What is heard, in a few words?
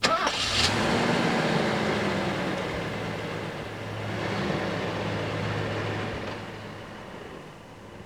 Car Mercedes Start w Idle; The Hollywood Edge; Transport - Land